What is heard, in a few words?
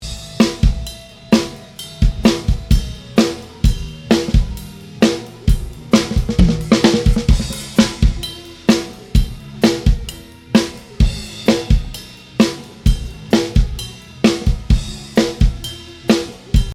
snare comp add, batterie